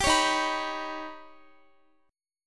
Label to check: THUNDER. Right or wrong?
Wrong